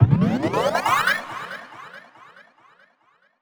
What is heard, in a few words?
Misc Short FX